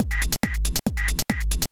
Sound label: Tech loop